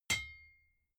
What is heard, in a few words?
hammer hit ring high